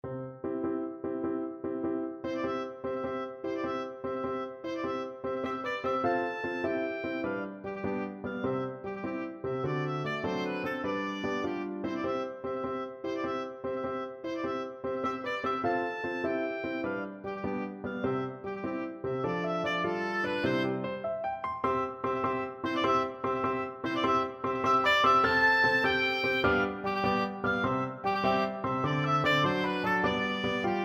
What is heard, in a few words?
Light Cav Overture Suppe, oboe